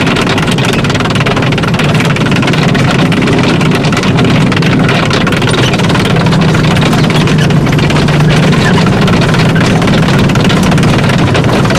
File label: tracks speed, tanks; War Thunder